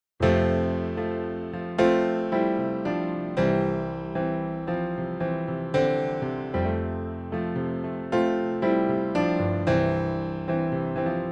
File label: Chord Progression